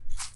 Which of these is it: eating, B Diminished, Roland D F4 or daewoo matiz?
eating